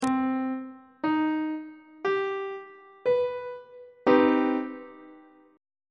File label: d double flat minor major 7th chord on treble clef